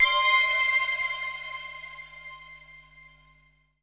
synth bell

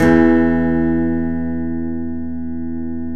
12 STRING; E-MU Producer Series Vol. 2; More Studio Essentials; Composer

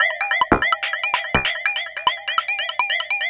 Evil Popcorn, synth pattern; Sonic Foundry (Sony Creative Software); Electronic Point Blank

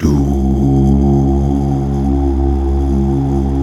Mal Duh; Best Service Gigapack 1

